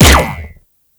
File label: Corpus UMP Shot; Warframe; Weapons